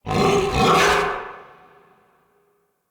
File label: Battle Dog Enemy Spotted, Animals; Vocals; Snc Battle Dog